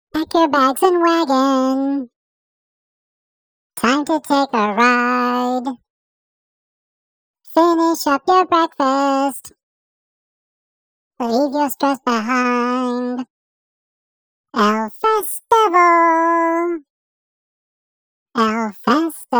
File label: Vocals Elf-ified; Elf Festival